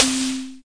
SynthPerc C4, Yamaha DX100; DRUM MACHINES

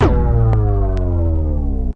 poweroff; Electro and Synthetic

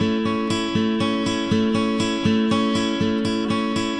acoustic guitar picking; GUITAR LOOPS

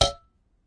spring pluck, Typewriter Kit

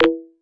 detuned blurp, Electronic Hits

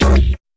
fx drum

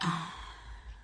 sexy ahh, Voice; single hits

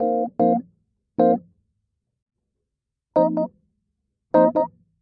152 mellow jazzy rhodes; KEYS ORGAN PIANO LOOPS

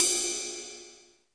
Ride, Roland R-8

